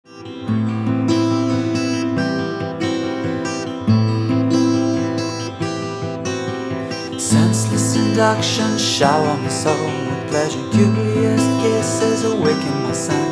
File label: summer song